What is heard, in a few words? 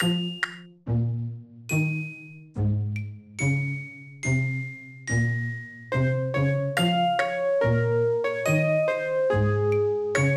Music Sad; Tales to Enjoy The Ugly Duckling